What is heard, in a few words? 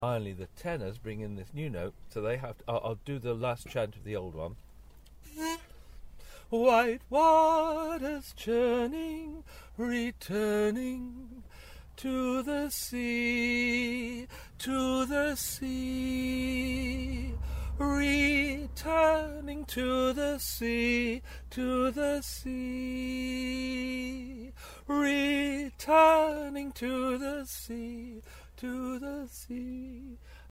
Tenor